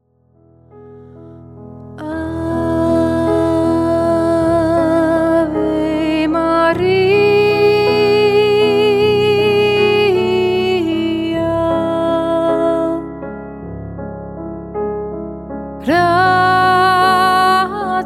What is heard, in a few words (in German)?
ave maria mit pianobegleitung; probe